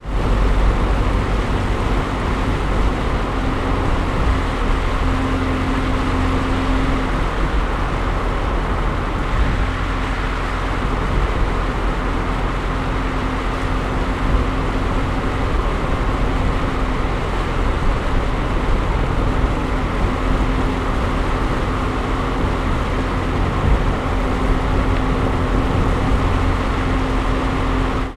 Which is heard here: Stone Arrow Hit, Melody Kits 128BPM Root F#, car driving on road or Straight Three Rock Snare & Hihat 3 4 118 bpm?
car driving on road